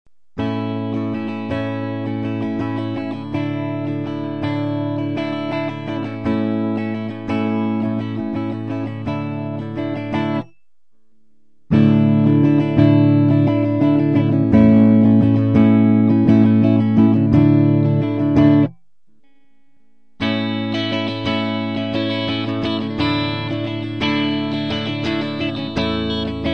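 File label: Purple Peaker, guitar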